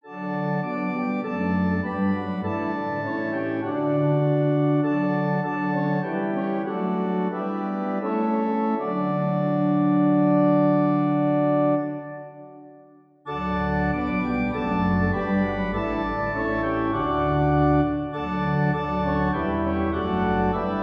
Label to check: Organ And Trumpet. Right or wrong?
Right